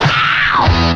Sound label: pow metal, Stabs